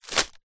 office document folder wallet plastic set down or drop